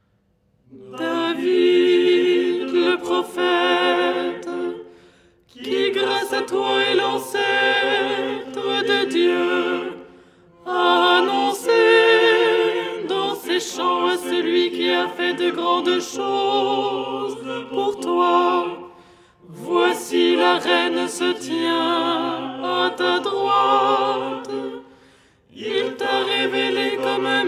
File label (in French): alto; Théotokion dogmatique; Les 8 tons en français; ton 4